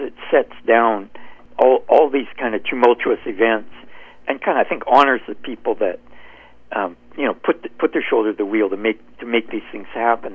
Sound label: David Thompson University Centre closure